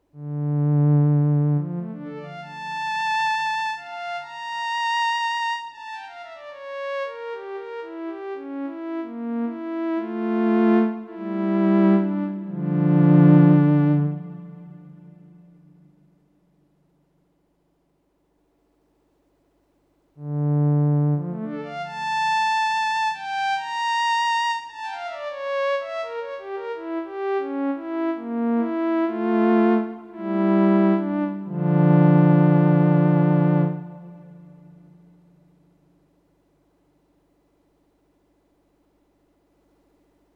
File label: Juno violin